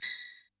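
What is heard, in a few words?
sleigh bell, PERC; Yamaha DD-65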